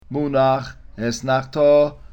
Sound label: munach esnachta, cantilation